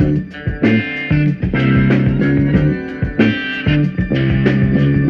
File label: guitar, Loops